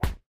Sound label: punch